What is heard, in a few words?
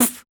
snare; foxdot default